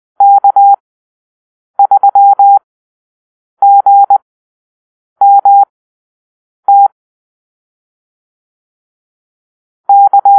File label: Call-Sign Drill, morsecode